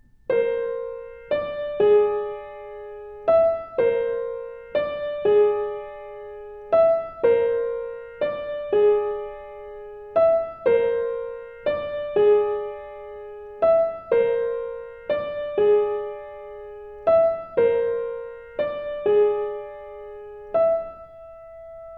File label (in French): steinway brut